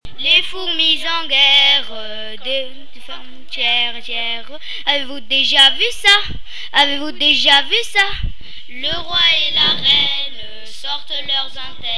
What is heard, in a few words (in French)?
groupe, chant